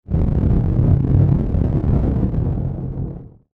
Static Vibrato Soft Reverb, Static Analog Distortion Warble